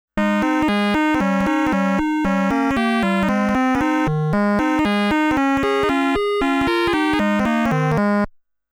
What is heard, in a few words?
High Score MUSIC; Namco Classic Collection Vol.2; arcade soundtrack